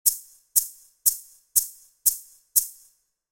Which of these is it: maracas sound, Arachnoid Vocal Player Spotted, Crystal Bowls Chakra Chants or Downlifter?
maracas sound